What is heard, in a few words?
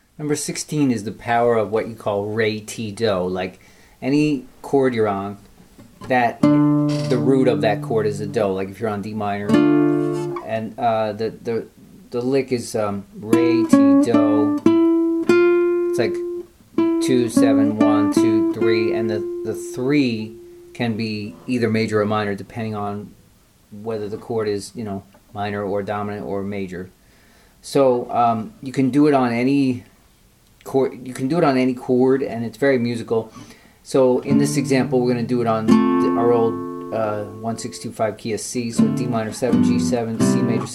jazz licks